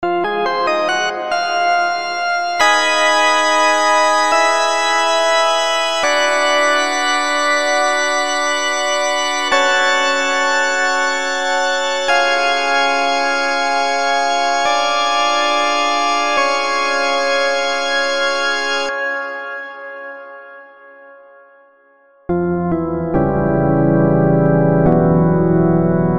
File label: organ